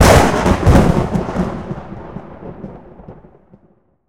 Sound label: slapstick Thunder